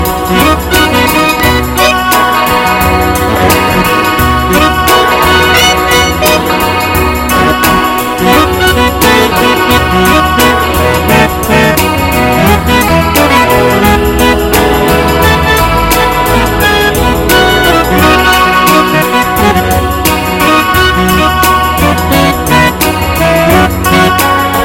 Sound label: So Sad but Sounds So Good